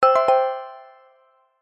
multimedia game tone marimba simple basic positive; zapsplat